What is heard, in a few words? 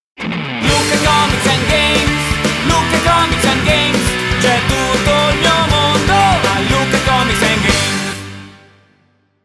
Jingle